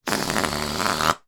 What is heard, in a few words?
foley balloon deflating with rasp